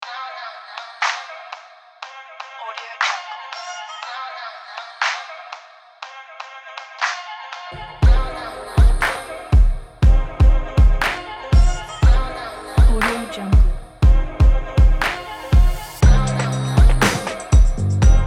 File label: hot summer hip hop